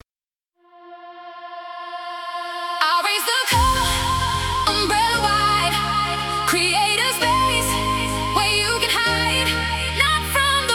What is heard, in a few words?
Trance; Avocado FM